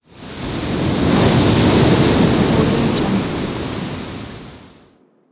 wind whoosh

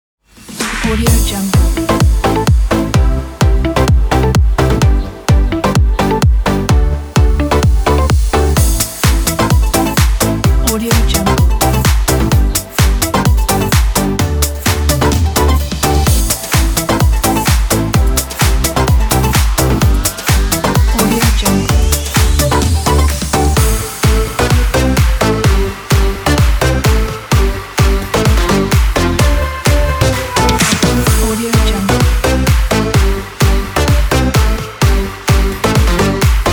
energetic dance pop

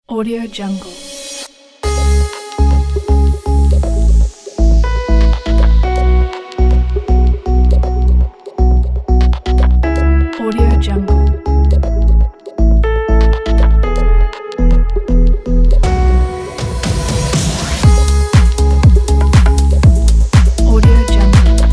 dance house summer fresh party

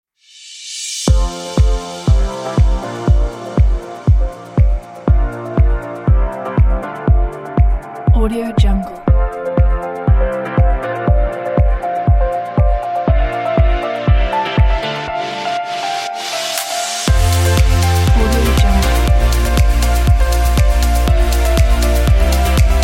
inspirational summer track

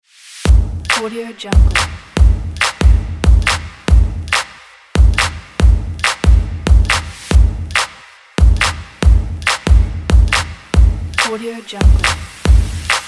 fast drums; neutralpoint

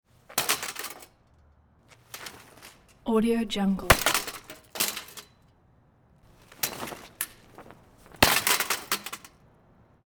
metal crash chair thrown toss concrete hit; prosoundfx

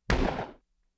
face hit thud; prosoundfx

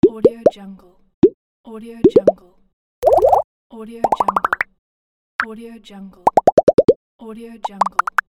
pops

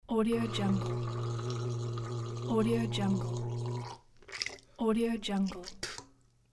gargle and spit

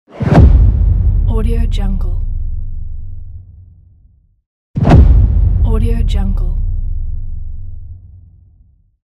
drum hit boom

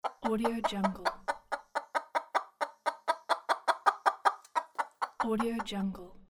chicken

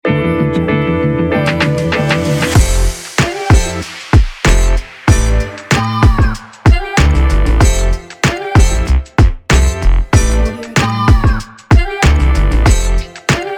vlog hip hop upbeat

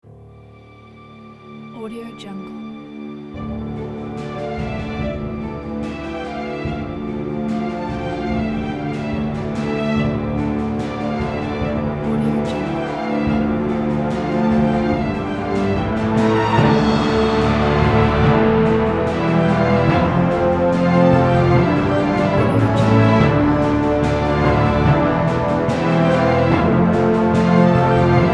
adventure trailer

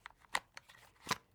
gun foley holster; prosoundfx

